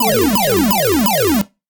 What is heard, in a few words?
8bit space shoot